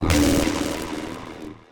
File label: death; fowl-play; enemies; bob